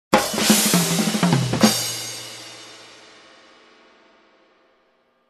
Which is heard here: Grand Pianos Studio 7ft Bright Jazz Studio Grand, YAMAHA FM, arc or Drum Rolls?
Drum Rolls